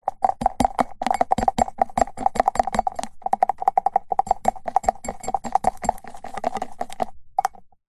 food drink milkshake thick stir plastic straw in glass; zapsplat